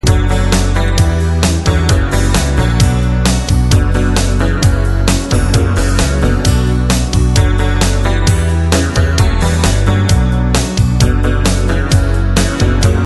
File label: melody